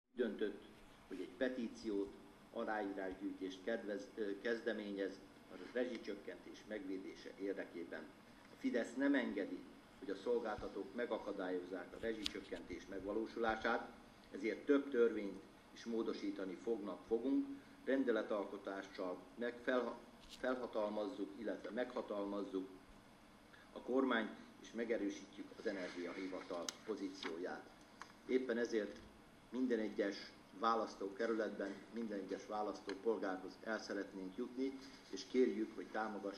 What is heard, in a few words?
digitar